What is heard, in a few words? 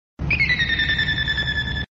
alert; gundam